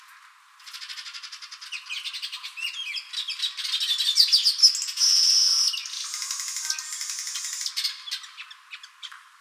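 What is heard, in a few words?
Ploceus cucullatus male; Village weaver; Male song includes various trills; Village weaver Ploceus cuculatus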